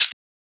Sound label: Shaker; FLPRO